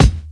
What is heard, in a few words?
00s sharp hip hop kick drum sound d sharp; Duration 0:01; Samplerate 44100 Hz; Mono